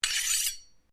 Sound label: Blade Swish Low, Sword